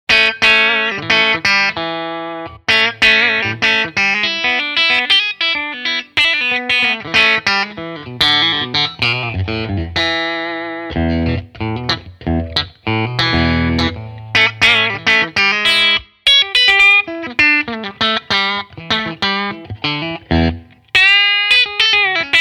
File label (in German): Steg clean; Larry Carlton T7 AWH